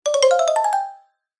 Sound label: cartoon footsteps fast scurry scarper warm mallets